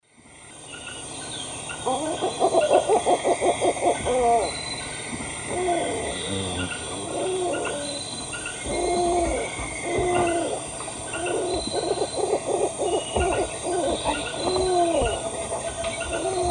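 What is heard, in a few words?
Zebrilus undulatus, calls playback; S. America; Eastern Lowlands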